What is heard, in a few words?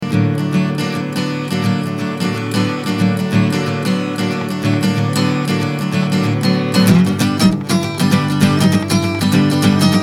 death song